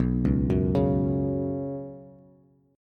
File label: B Suspended 2nd B down Bass, Bsus2 chord; arpeggiated; JGuitar